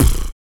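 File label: vox percussion, Vocal Planet Jazz FX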